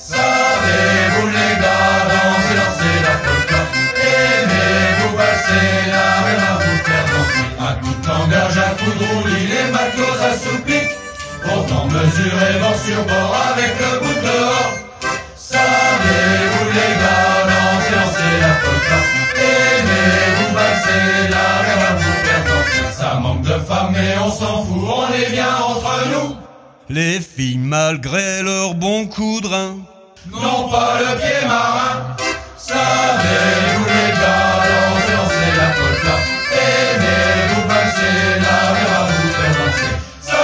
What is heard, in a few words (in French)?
la polka des marins